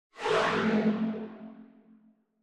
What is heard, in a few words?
Dark Magic Movement; SoundsCrate